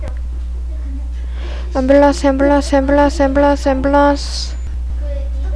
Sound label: ambulance